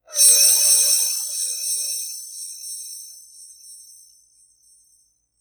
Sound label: Magic